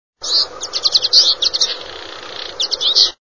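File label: twite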